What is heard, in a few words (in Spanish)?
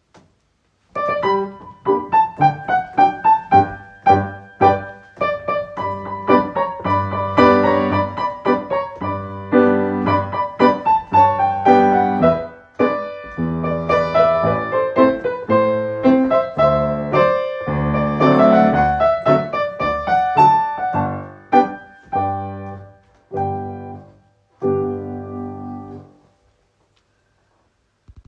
Musica Grupo Puntas